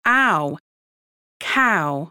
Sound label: COW